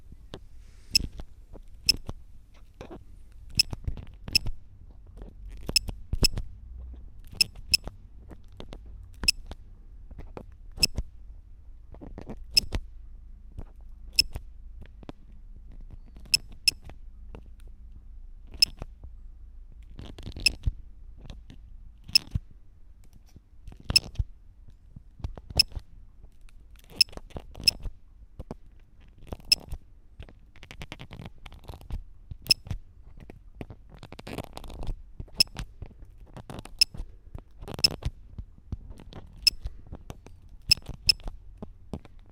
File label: Beats; MD Instalation K 2; HomeRec Mix